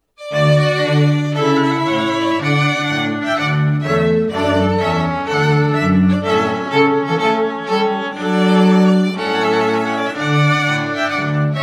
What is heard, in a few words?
Trumpet Voluntary